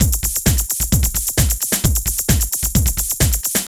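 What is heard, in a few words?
drum loops, right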